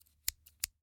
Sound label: Scissors, snap, snip, shear, small, double; Eiravaein Works; Vaeyan IV